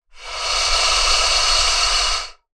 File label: animals alligator hiss